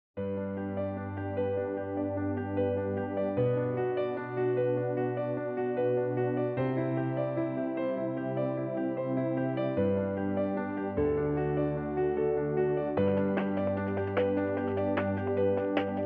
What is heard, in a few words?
Warm, ringtones; China Mobile; A3s